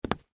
Combat Boots Single, Footsteps, Combat, Boots, Single, Step, Wood, Surface; Blast Wave